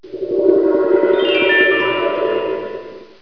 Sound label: sword 10000hz